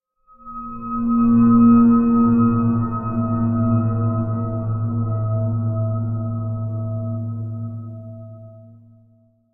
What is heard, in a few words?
Drone Up & Down Scary - Long Moaning Musical Pad With High Overtones; Hollywood Edge; sound designer's toolkit v2